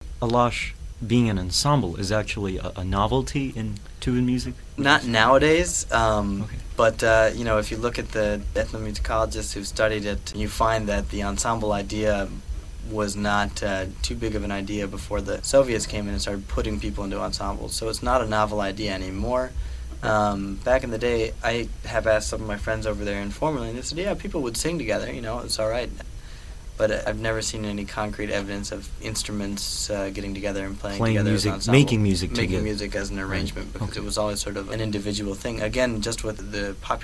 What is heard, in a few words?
Ensemble